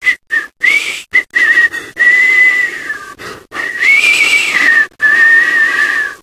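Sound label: nervous whistle, survivor voice, manager; left4dead; Source engine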